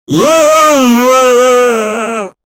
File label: the sound of a man, superhero